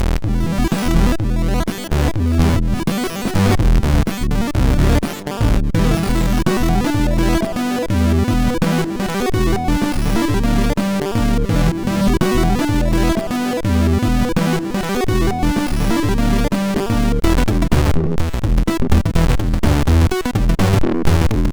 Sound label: Drowning A ProgStep Adventure, MOS6581R4; sid